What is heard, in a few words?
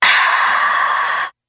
"ah" exhale